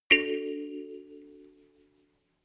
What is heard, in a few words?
notification kalimba rattle triad major